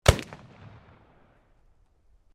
PISTOL BLACK POWDER UNKNOWN FIRING, Black Powder firearms at a shooting range; Pistols (Sound Effects:Guns)